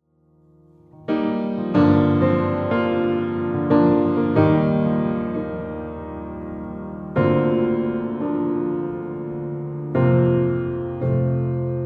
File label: Piano Spiritual